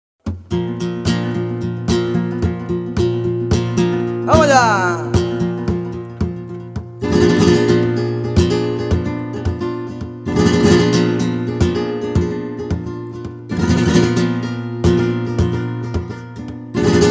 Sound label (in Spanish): Bulerias